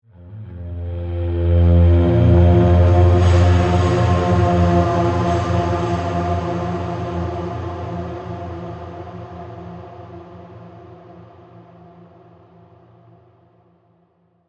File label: Black Hole Low Wind Bend